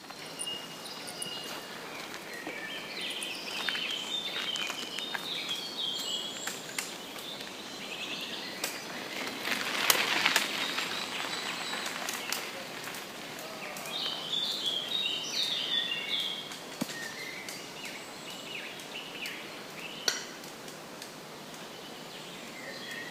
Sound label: Footsteps